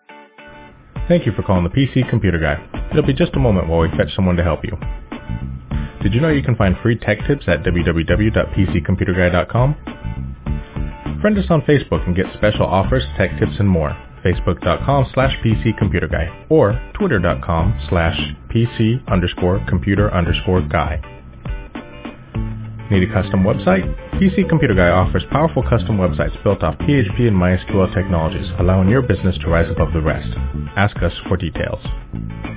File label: Ring Music